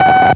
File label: Spark Coil Dash, Morse; NuMorsePro